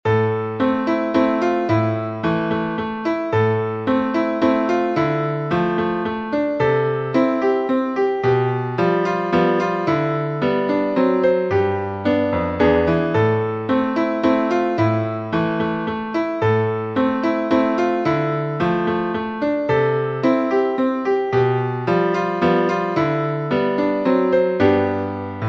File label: waltz minor for piano; brahms johannes